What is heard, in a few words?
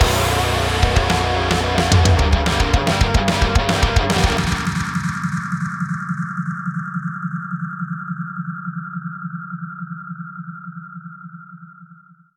Zombie Dog Round End; Zombie Global